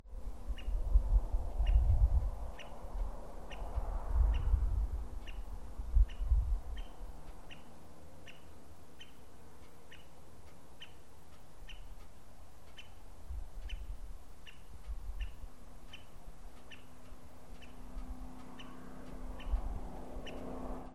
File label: American Three Toed